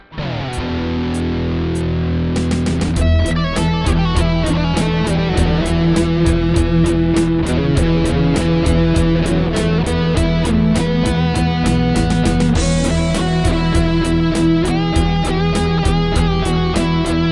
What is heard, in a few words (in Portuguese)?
solo em lick